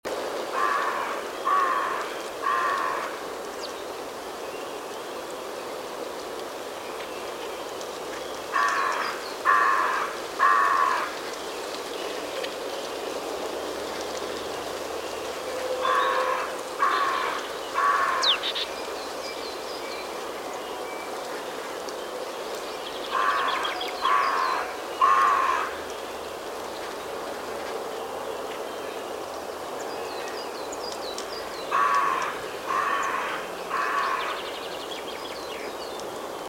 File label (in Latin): Corvus corone; SETE